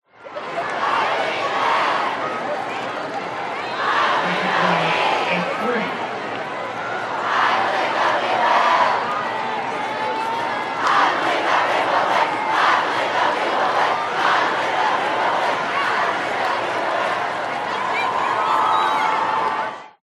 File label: american football crowd chant sing